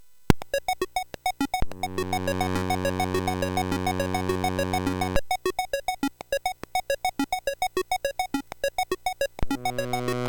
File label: sid MOS6581R3; Marble Madness